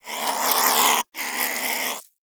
Cryptilex Vocal Snore; Characters; Warframe; Hunting Critters

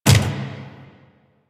APC Turret Dry Fire; Warframe; Lasrian Turret

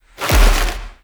Drifter Pull; gameplay; Warframe; NineteenNinetyNine; Stage12PartyOfALifetime

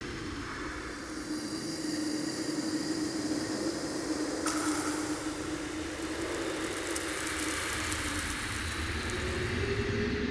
Crypt Bed Small; Ambience; Champion Crypt

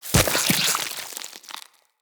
Root Spider Web Shot Proj Burst; Animals; Spiders; Abilities

